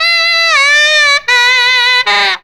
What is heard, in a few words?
FUNKY SAX; Zero-G; Funky Elements